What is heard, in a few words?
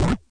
machine